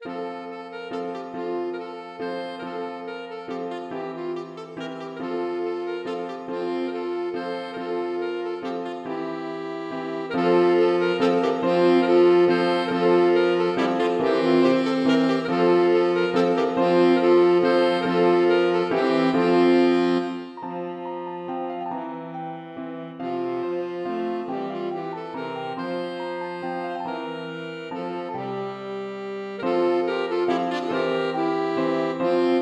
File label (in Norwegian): to altsaksofoner, tenorsaksofon og piano; Bang; La ere de Malte